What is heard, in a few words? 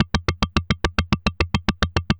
POT, Anime Sound FX; COMIC